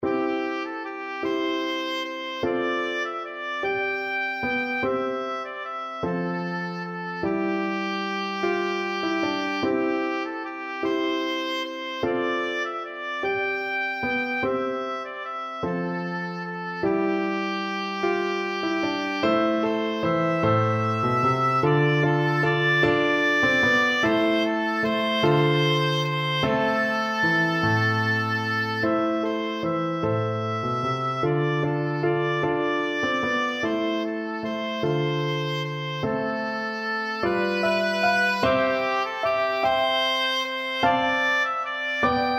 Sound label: skyeboat, oboe